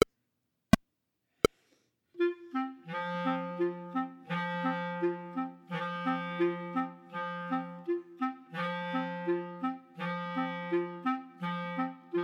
Gavotte en Rondeau, Chamber Music for 3 Clarinets, minus 1st part; Dandrieu; Caboodles of Clarinets, Trios, Vol. 3